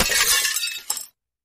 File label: Glass Box Crash